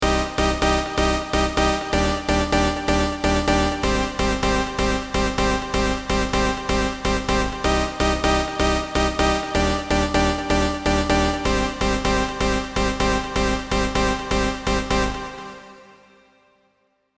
modern simple lead

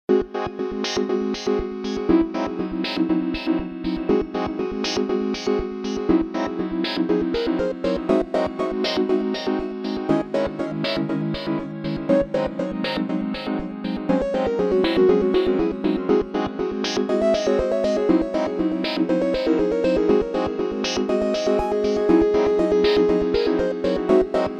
pad abstraction

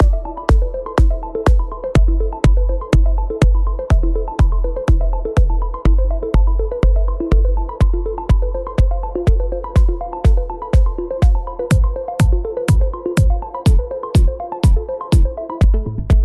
signature kicks

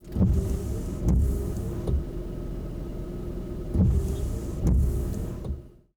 car windshield wipers speed 3

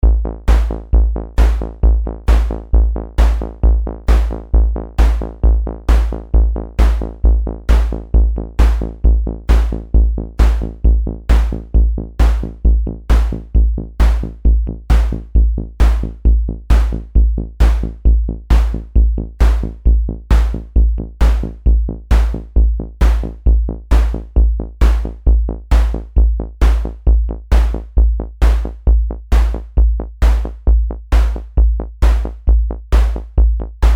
techno machine noise

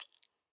mouth chew bite foley

